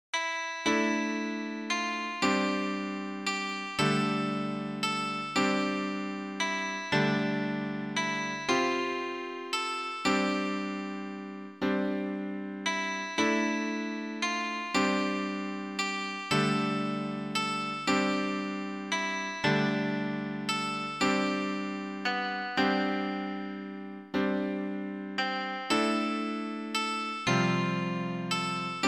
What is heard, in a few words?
koto; G chosei